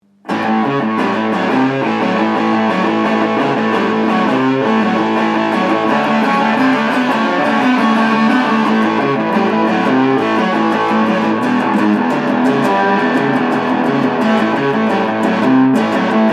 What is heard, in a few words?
rocknroll; lampes; 12u7; proto